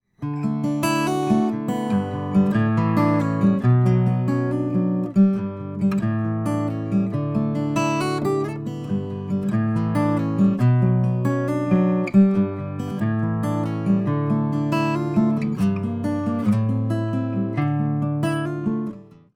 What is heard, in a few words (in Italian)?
Saturazione Arpeggio Acustica; 2.2 in configurazine disto; amplificatore BBQ 2-2